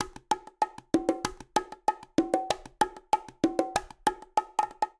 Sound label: Son Bongo 192bpm; Latin Percussion; Ableton; Factory Packs